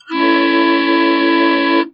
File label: Bontempi B3 A3 Chord